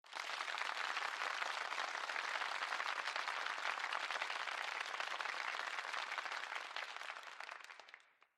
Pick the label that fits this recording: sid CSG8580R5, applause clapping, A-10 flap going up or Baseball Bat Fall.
applause clapping